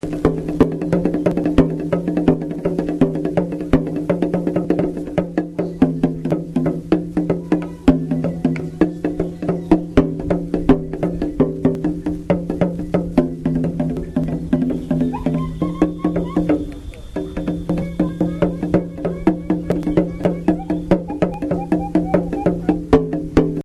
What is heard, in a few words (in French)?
bata cuica, tambours; chateauroux; Divers tambours